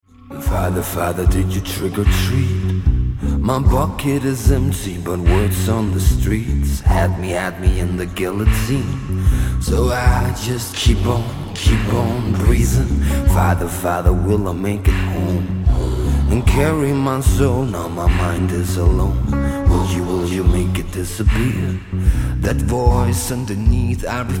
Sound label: Blues